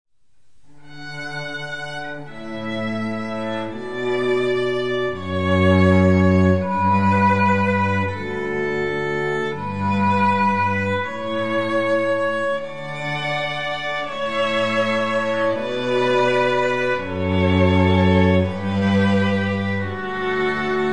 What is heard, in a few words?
trio canon